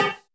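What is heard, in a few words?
distorted bongo; Drums In Your FACE!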